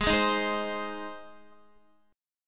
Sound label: Ab Major Ab, Guitar; strummed; x,11,8,8,8,8; JGuitar